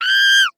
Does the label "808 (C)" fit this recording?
No